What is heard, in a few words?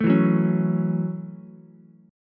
A 6th, Ukulele; strummed